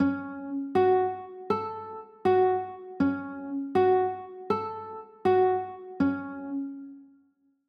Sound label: a 6, chords